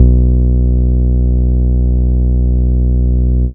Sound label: BAS; Demo Multitracks; 90bpm; bass